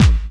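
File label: snare drum bass drum, Dance Mega Drums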